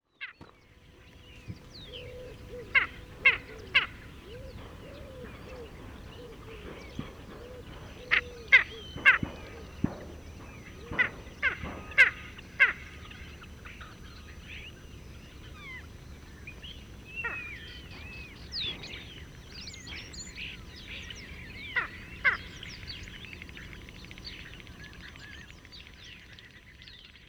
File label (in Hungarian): iberiai sas hangja